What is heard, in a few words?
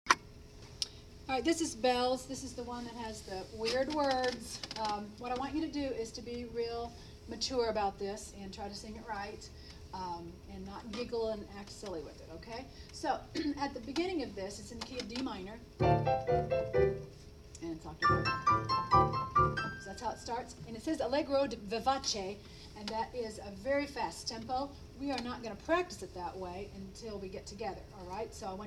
The Bells TENOR